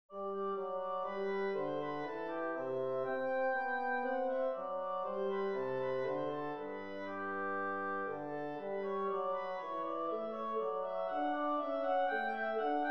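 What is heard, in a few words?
bourrée, G major; sol majeur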